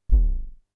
bd hip, Drum Machines, Formanta Radio Plant Polivoks